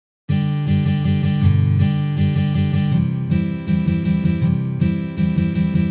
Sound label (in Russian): Boj Kantri na gitare